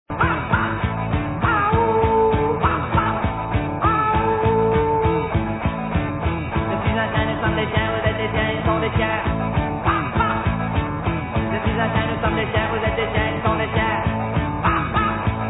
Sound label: wha wha